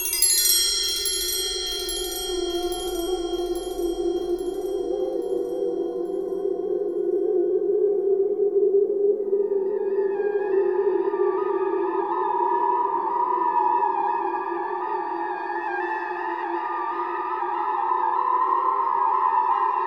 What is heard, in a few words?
Bell